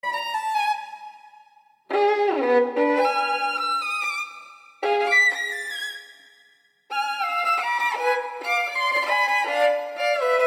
Violin Sonata in A Minor; Violin Sonata No. 2 in A Minor, Op. 27 No. 2; IV. Les furies

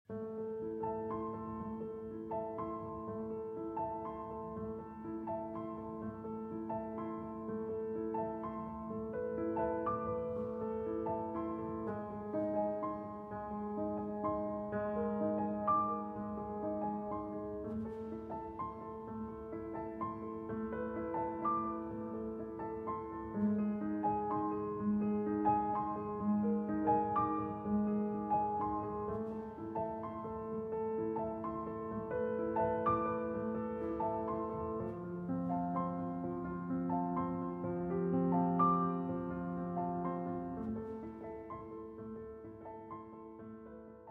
Piano Duet; III. The Somnambulist

